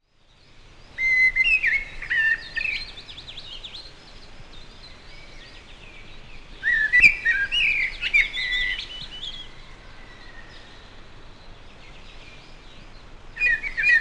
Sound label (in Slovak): drozd, zvuky vtakov